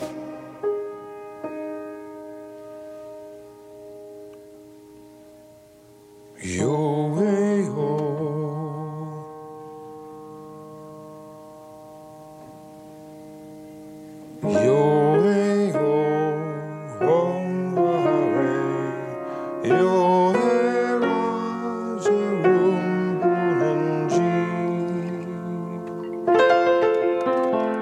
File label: Piano Version